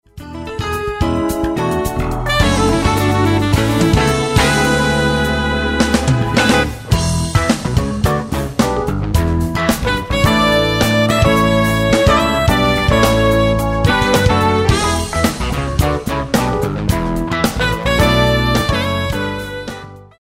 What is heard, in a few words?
Jazz Fusion